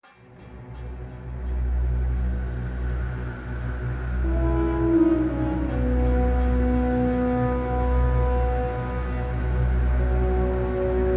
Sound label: Game Clear; Ace Combat 6